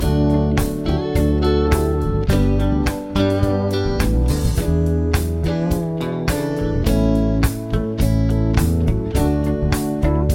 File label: progs with applied chords